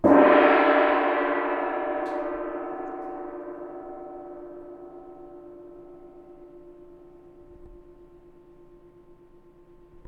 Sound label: Gong Sound; Itadaki Smash